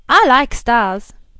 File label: death